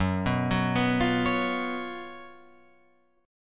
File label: F sharp Major 7th Suspended 4th Guitar, F#M7sus4 chord; arpeggiated; Open F